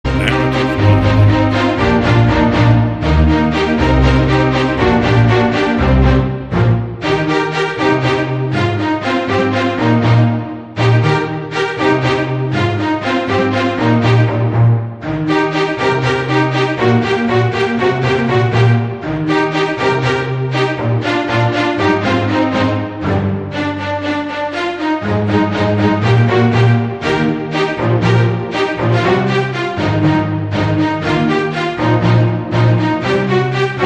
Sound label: Orchestral; MLPFiM